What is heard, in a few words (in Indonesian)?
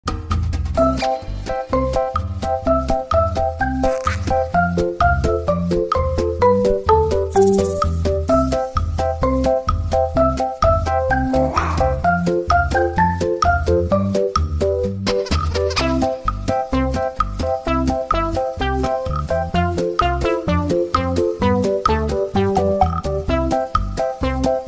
nada dering korea imut ringtone